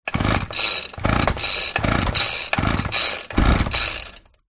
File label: household whiper sniper starter cord pull no start out of fuel